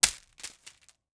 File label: Wire Bunched Hitting Cement; AdobeCC